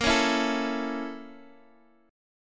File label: A sharp Minor Major 7th Flat 5th, down, Guitar, DADGAD